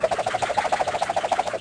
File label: Game Med Start